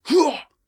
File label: Miner Throw; Col Miner Throw